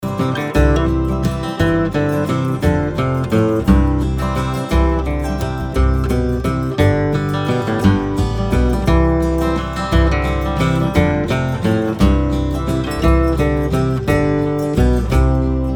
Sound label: guitar